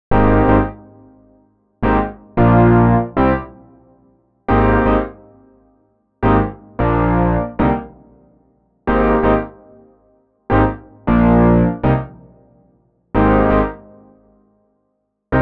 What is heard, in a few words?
piano